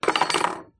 industrial metal hacksaw junior down on surface

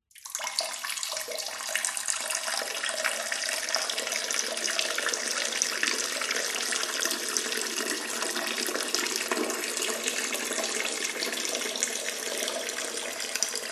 human urinate male toilet; zapsplat

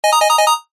multimedia game retro musical warning

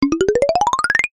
cartoon climb fast steps ladder cute

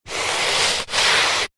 household brush small scrub carpet long strokes

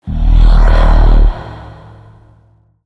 Synthetic

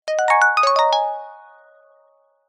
multimedia alert notification glassy plucked zen

